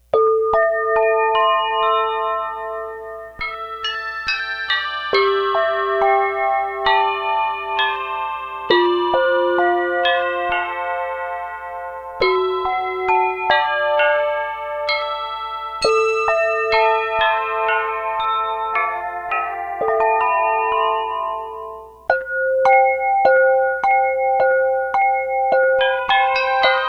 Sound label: cup gong cymbal crotales orch bells; CHE CYMBAL